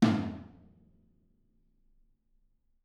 R B Floor Tom A Room; Sonic Foundry (Sony Creative Software) - Drums from the Big Room The Mixes; R&B One Shots

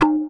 THROAT; Spectrasonics; Metamorphosis; THROATERS

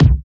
BD, BD Dance

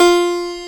PIANO A2; WS#6 JAZZ

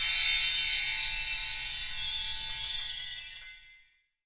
BELL TREE; 3D Sound; 3D Keys VOL-1

